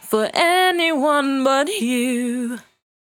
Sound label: 68bpm D, Velvet Vocal; Zero-G